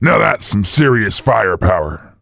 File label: cheer; svencoop; voice commands; SeriousSam